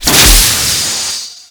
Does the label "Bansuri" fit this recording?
No